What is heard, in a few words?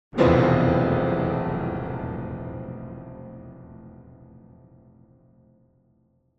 Piano Danger Chord; Hollywood Edge; sound designer's toolkit v2